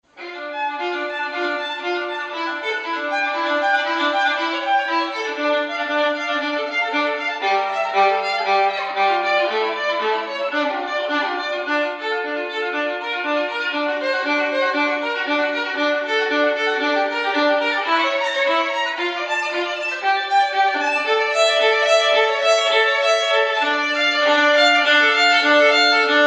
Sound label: violin